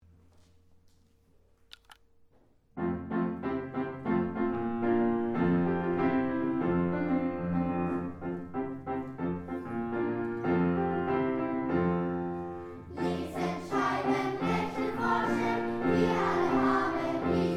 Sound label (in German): Hymne Klasse 4a; Ambergerschule